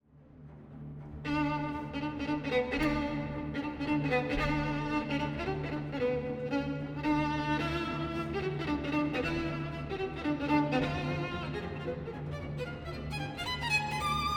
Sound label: Violin Concerto in D Minor; Op. 47; III. Allegro ma non tanto